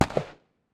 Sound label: rifle dist; Weapons